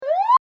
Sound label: Emergency Police Siren Short Burst